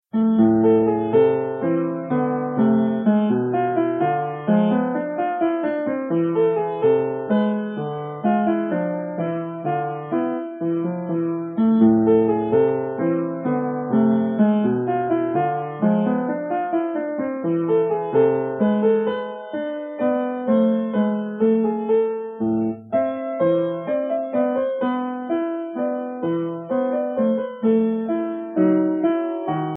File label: minuet